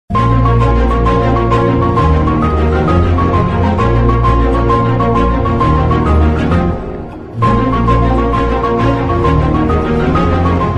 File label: love bgm